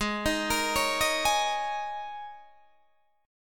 Ab Suspended 2nd Suspended 4th Ab down Guitar DADGAD